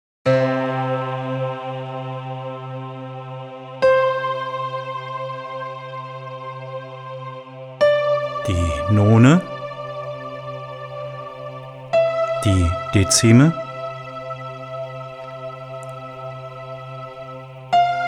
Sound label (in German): Intervalle jenseits der Oktave